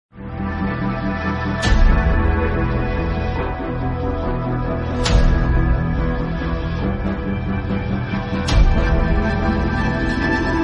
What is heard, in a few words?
bike song, bike rider; pulsar bike